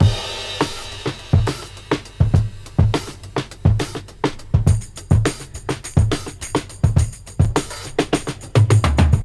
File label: 104 bpm breakbeat sample c key